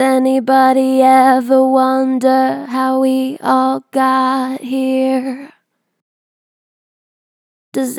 Str Vib Anybody ever wonder, Vocals, Natural Vibrato Strong; Harmor Vocals